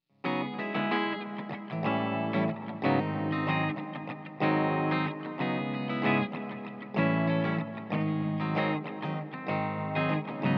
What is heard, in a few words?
Les Paul Clean Ghost Echo